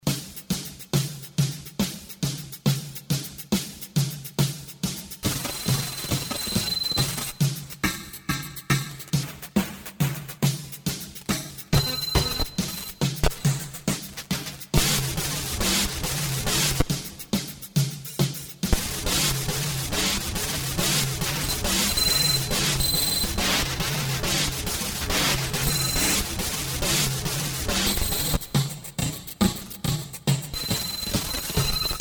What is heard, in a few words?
bending; Korg; 03rw bent audio